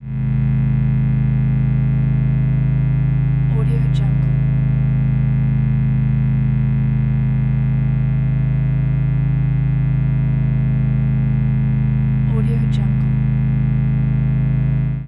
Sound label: power hum